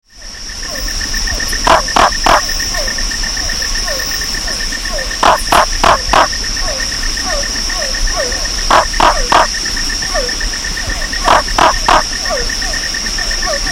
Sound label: Hypsiboas Lundii; amphibia